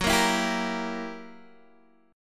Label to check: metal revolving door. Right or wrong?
Wrong